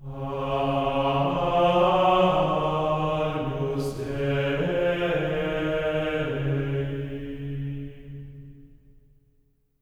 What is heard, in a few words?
AGNUS C#4, Symphony of Voices; Spectrasonics; GR AGNUS